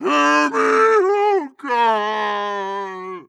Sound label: idle moan dry, zombie vox; creatures